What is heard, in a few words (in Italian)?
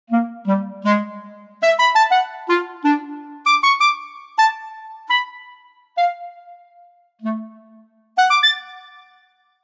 CLARINETTO staccato